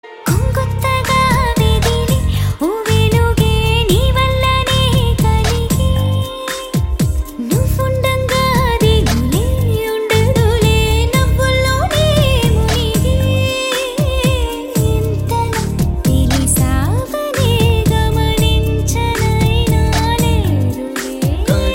Female Ringtone

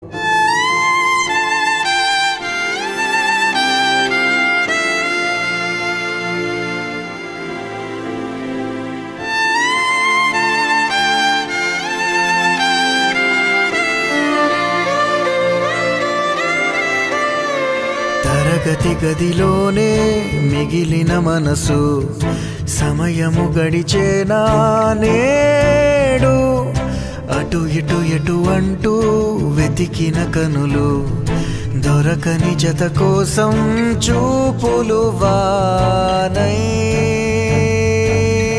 Pathos Violin Sad Ringtone

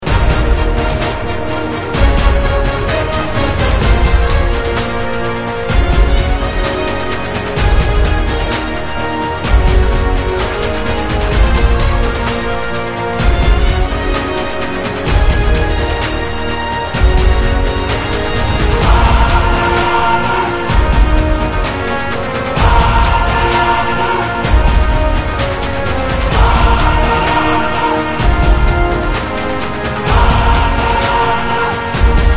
Karaoke

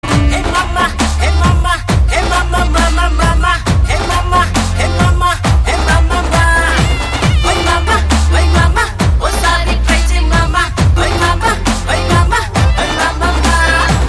Ringtone

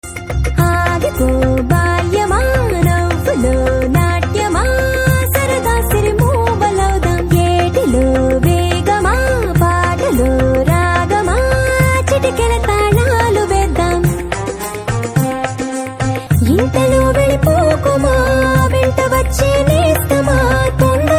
Ringtone

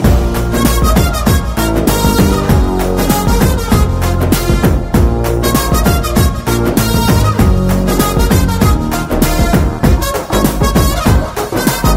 Saxophone Ringtone

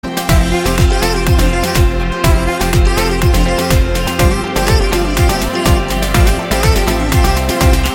Love Bgm

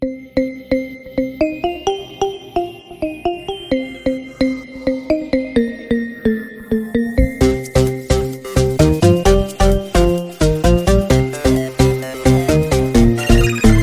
song background bgm music bits

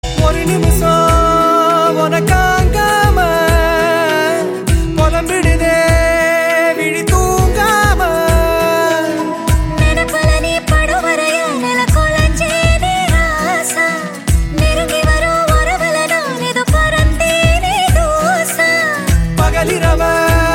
Rain Version Love Male Ringtone

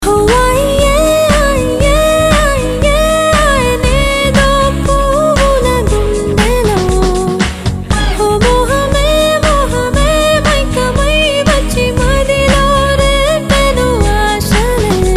Female

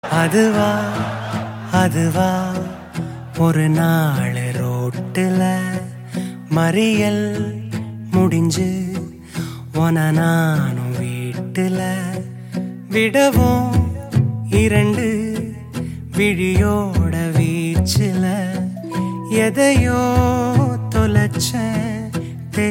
Rain Version Male

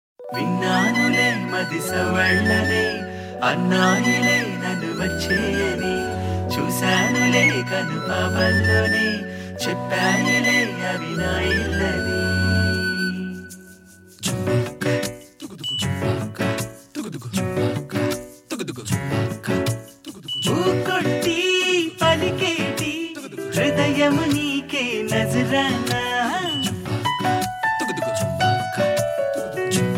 Ringtone

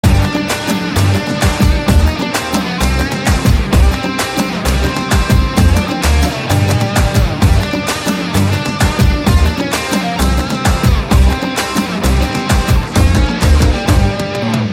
Guitar Bgm

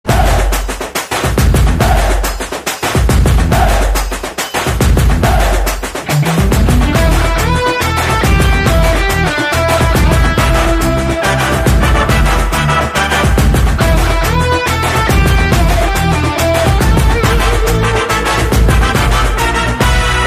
Drum Beat Bgm

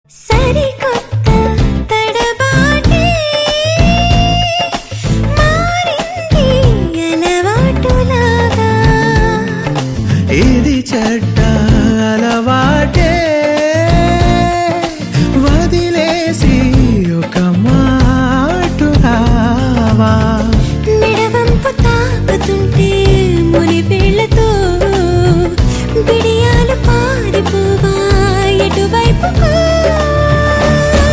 Female Ringtone

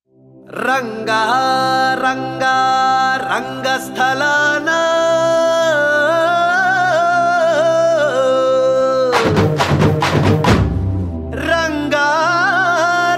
Humming Tone

